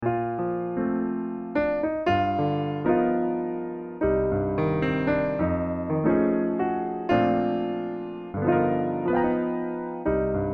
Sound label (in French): balade piano